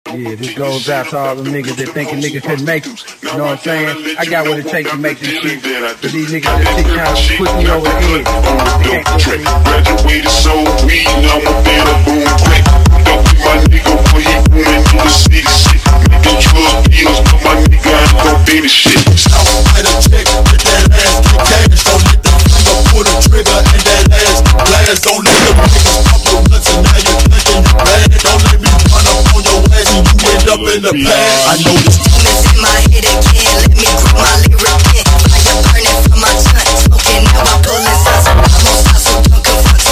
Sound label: Hardbass, phonk music